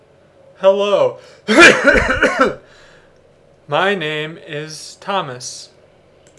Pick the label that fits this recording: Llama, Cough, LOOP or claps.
Cough